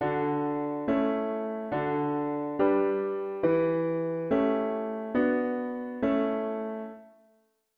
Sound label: root V7 bass